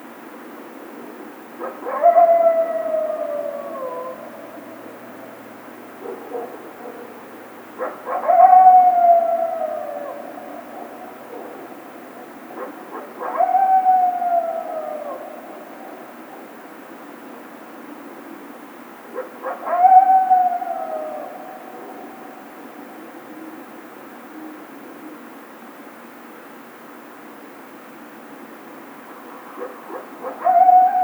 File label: howl, Coyote